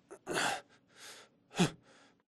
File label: spectre zombie drag grunt; Titanfall 2